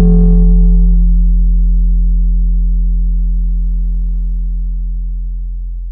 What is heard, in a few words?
Instrument Marimba; Ableton Live 12 Lite; Vector; Sprites